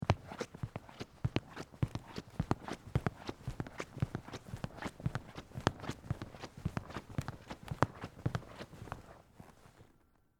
Footsteps Stage, Foley, Footsteps, Stage, Floor, Walk, Across, Wood, Surface, Theatre; Blast Wave